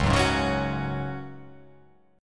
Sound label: C sharp Suspended 4th C sharp down, chord; strummed; JGuitar